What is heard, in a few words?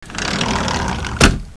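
door sliding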